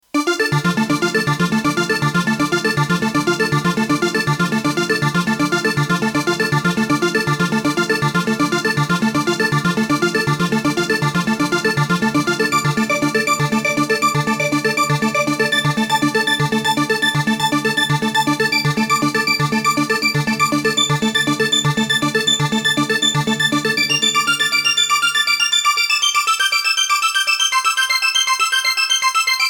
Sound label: Korg R3; Miles